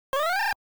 E-Type Missile Hit

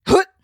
flyer attack

